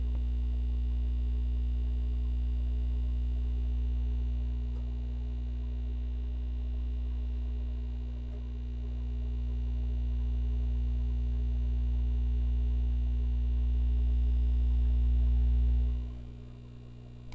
Hummm

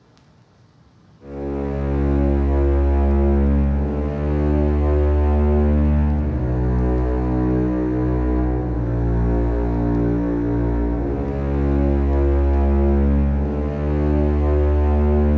Cinematic Suspence Violoncelo

